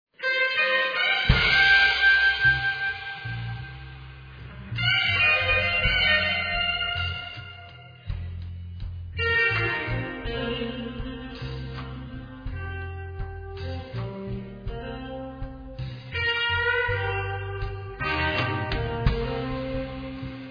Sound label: blues guitar